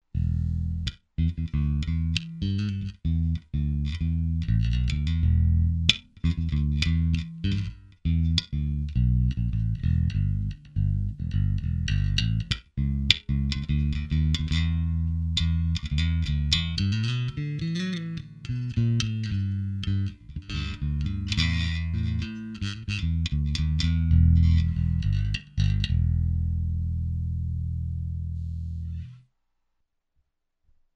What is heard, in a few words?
NATURAL CLEAN, Zoom 506 II